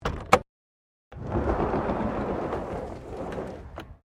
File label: HELICOPTER 1990 SIKORSKY UH60 BLACKHAWK SLIDING DOOR OPEN; Helicopters; Vehicles